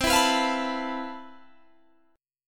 C Major 13th, Guitar Open G, chord

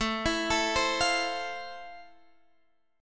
F Major 7th A

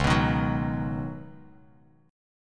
Db 9th Suspended 4th Db down Bass Standard; strummed; chord shape; JGuitar

